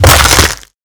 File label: bonebreak; killmove; Garrys Mod